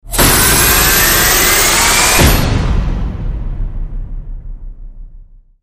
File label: Rise To Hit; Rise To Hit Hyperspace